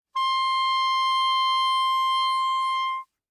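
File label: Soprano Saxophone non vibrato ff C4; Woodwinds; Bb Soprano Saxophone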